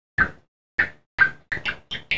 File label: snapping